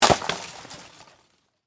Branch Tree, Impact; Miscellaneous Impact (Sound Effects:Impacts)

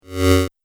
Multimedia Lo-Fi Rollover, Mouseover; Business Programs, Apps And Computing Noise

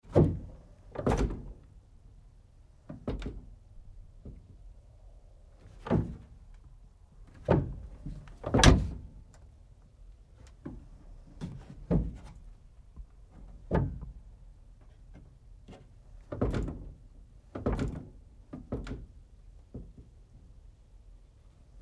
Truck GMC Savana Door Rear Swings Moves; Vehicles

